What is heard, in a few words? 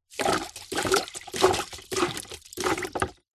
industrial pump hand plastic small pumping water plastic bucket